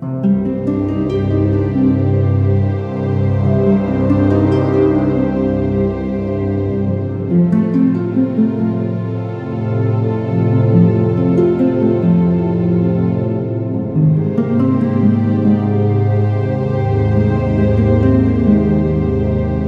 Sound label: Forest Breath Loop; Landscapes; Legends of Ellaria